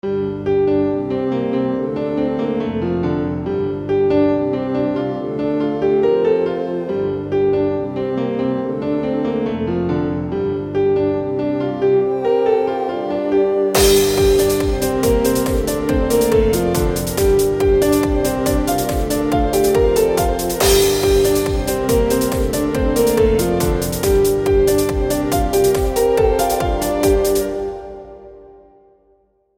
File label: Piano Test